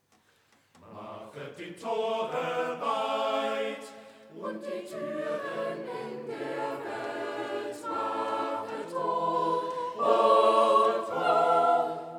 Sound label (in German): Chor